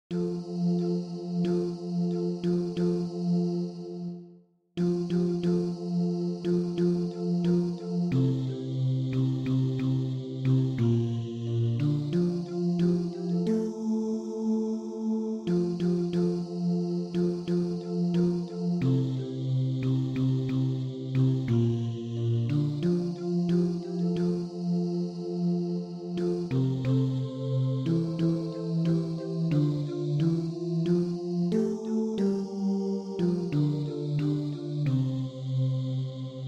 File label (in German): bariton; Männer